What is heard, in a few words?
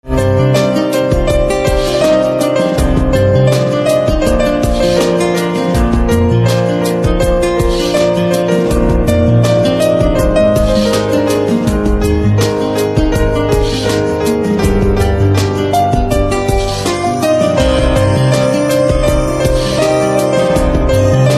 Piano Instrumental Ringtone